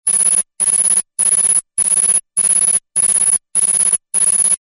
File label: alarm constant buzzer